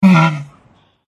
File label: rhino wound